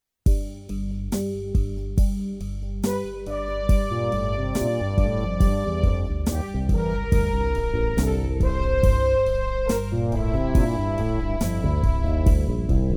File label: Instrumental